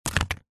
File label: papadum set down stack on another